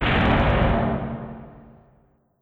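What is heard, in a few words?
Collision Exterior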